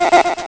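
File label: TALK DRUM; CMI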